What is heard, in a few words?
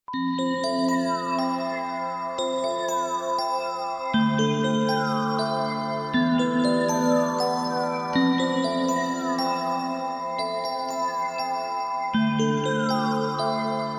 MUSIC BOX